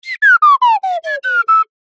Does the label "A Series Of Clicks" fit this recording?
No